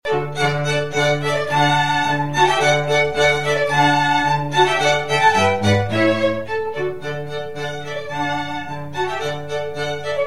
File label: Strings, SQ Spring